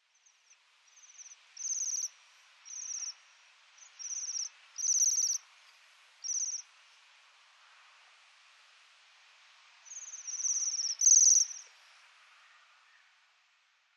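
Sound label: Bohemian Waxwing; koe; kirenjaku; setana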